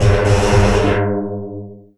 Mole Bumpers, Fx; Sonic Foundry (Sony Creative Software); Esoterik Beatz

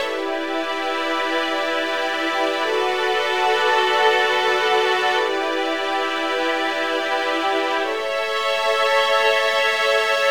strings, analog funk; Mixed Berry Jam; Sonic Foundry (Sony Creative Software) - What it Is! 70s Analog Funk

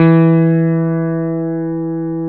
CP70

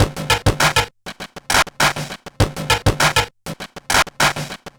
Bent RX17 (100BPM), Circuit Bent

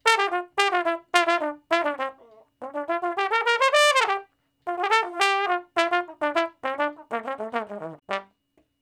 Trombone Shuffle (G); Sonic Foundry (Sony Creative Software); Horncraft for R&B; Solos

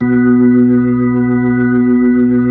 B-3

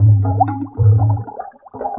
Water Drum, Acoustic Beats; Sonic Foundry (Sony Creative Software); Myths of Technology

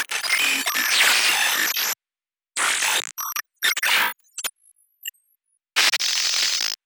Glitch

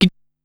"Chhl", Korg Wavestation-SR Drum Kit

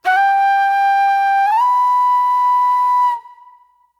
Train Plate Flute; Sonic Foundry (Sony Creative Software); Manhattan Flutes; Session 03 - Classic Caddy

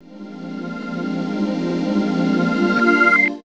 DR. LOOP, TRANCE; X-Static Goldmine 3

